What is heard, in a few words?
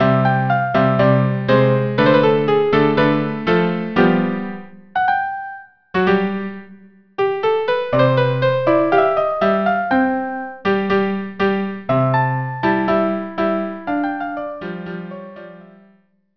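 violin viola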